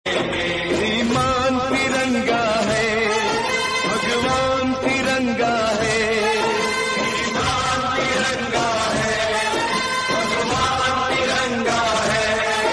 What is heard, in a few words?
Independence Day Song Ringtone